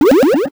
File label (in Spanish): botones; footbrain